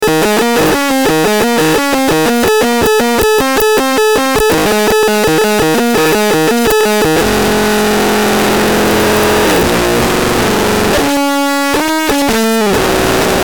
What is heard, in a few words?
pick